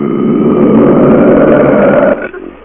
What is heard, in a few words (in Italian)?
Bestialuto, Rutti